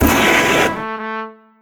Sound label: trombone; rainblower; tf2